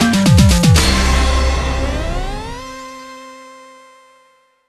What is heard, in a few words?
game over; tf2ware ultimate; music game; wii 18volt